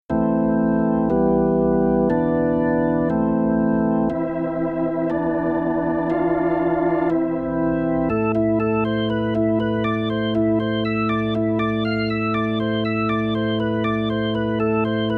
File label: Omnisphere Hiwatt Hammond